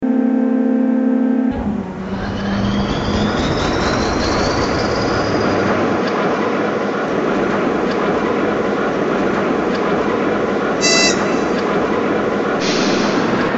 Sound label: 2TE116